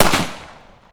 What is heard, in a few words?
echo, csnipe; weapons